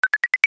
Workout Start Autodetect; iOS Sounds

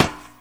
ladder step; Original TK Sounds

